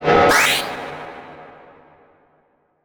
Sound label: Transition Hit Impact Electronic; Adobe; Production Element